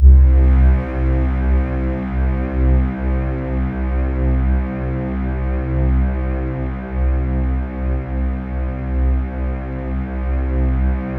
C2, Synth Leads

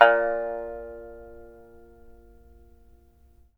SNXUAN A#3 MF, Heart of Asia; Spectrasonics

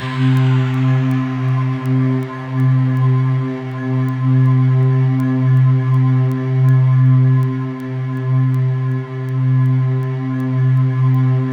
C2, Synth Leads

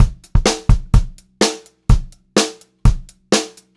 Pop-Rock Drumloops, 126 POP

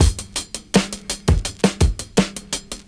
OLD SCHOOL, Breakbeats

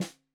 Snare 65 Reg Stereo, Deeply Sampled Drums

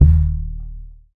TUB BASS D#1

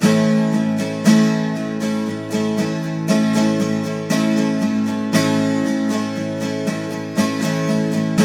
12 STR MAJ A#, TWELV; Spectrasonics; Hans Zimmer Guitars VOL-1

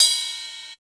CYM, Drum & Bass, 160 BPM; Ueberschall; Drum & Bass Flash Trackz

